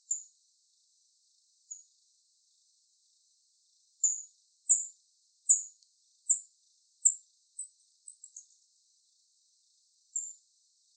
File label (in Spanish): Murcielago Myotis prob; Ecología del Paisaje Sonoro